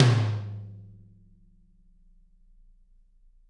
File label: TT, Toms; Bob Clearmountain Drums I